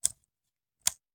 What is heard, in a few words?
Machine Gun Foley; Sound Ideas; Guns